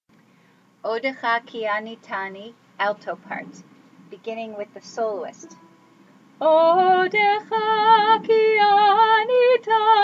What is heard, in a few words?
alto